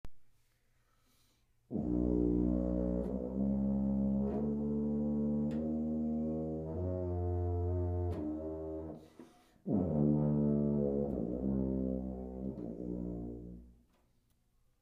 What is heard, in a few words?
tuba